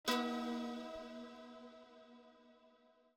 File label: Stab; 344 Audio; Conspiracy Theory